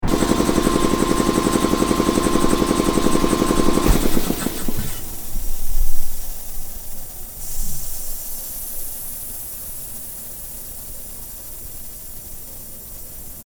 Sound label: Compressor, Air Compressor; Compressor Medium Distance, Run, Off; Machinery; Machines